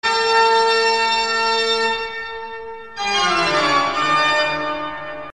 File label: Bach Fugue, sonnerie